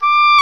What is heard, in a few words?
Oboe f; Roland L-CDX-03 Disk 1; Oboe 4-8